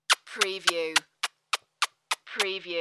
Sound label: Pucker Kisses